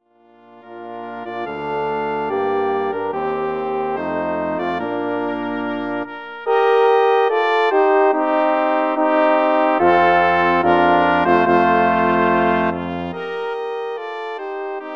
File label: Bridal Chorus